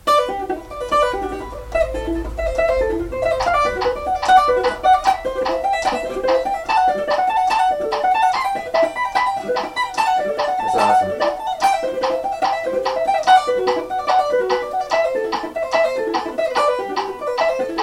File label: 3 string sweep arpeggios fast, guitar; sweep picking